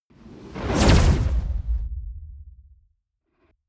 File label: whoosh fire zap flutter